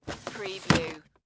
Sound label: Drawer Close Plastic; Sound Effects Bible